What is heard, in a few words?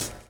DRUM CHOP; AMG Gota Yashiki Groove Activator